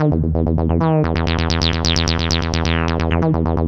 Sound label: E2, synth line; 90 s Sample CDs; NiceBeats; SynthLine VOL-3